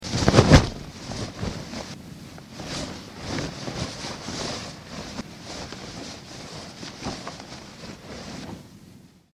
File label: parachute; Quicksilver; Drag Race